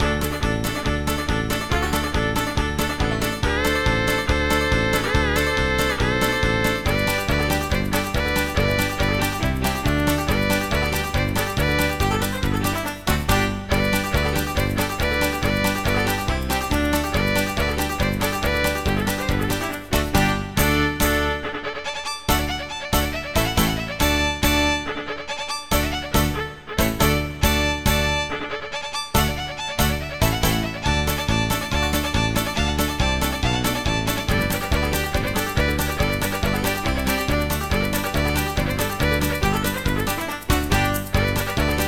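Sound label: Bluegrass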